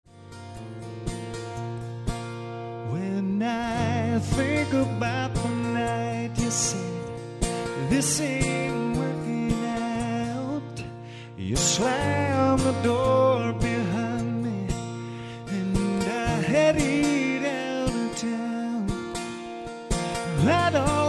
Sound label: live, Acoustic Guitar